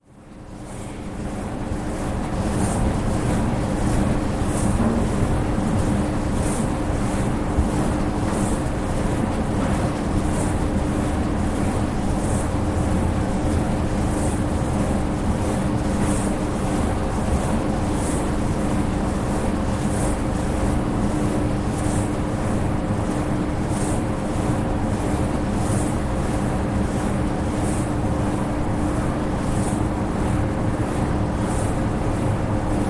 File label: cornish wind farm heard up close